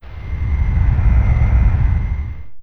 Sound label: heavy gunship move